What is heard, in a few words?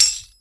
TAMB, Roots Disco; USB Soundscan vol.32; AKAI